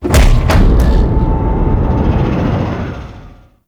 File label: Glass Sphere Void Shake; Ambience; Gameplay; Warframe; Entrati